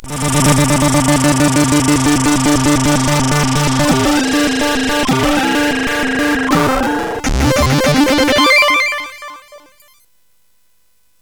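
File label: sfx, MOS 8580; hvsc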